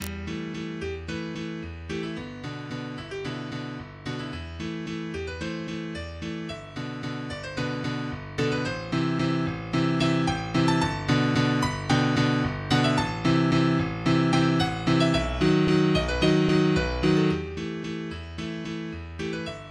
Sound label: Waltz